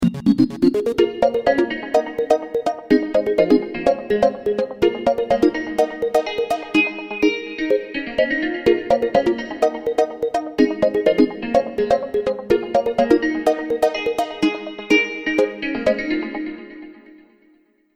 start round, music

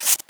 sticker scratch; Counter Strike Model Pack; CS:GO

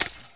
footstep left, stalker; Half Life 2

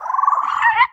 idle, headcrab reviver

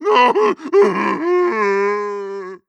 idle moan dry, zombie vox; creatures

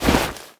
arm oneshot, foley

